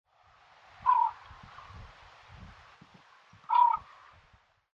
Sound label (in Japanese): watarigarasu, nemuro